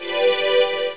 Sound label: key; Museum of Anything Goes